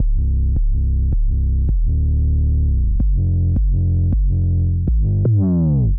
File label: Bass